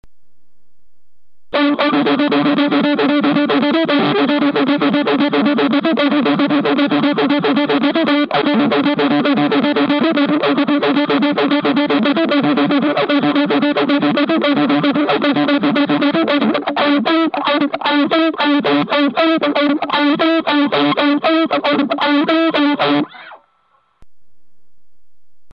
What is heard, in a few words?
Envelope Guack Tube Zipper